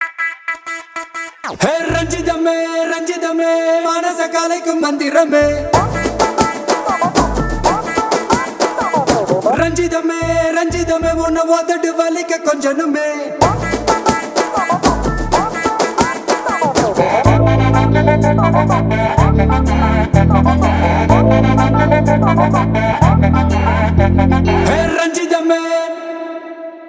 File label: Song Promo Tamil Ringtone; Varisu